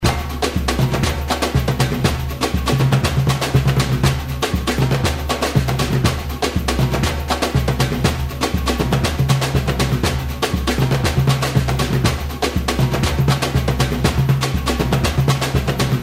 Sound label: Levada Loop, samba